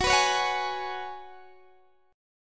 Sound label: Gb Suspended 2nd Suspended 4th, Guitar DADGAD